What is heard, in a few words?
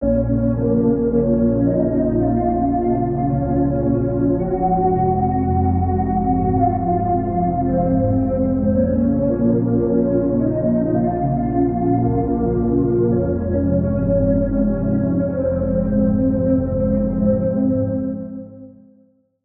Key - Downside; Routed Sound; Vital Expansion - Street Vanquisher Vol.1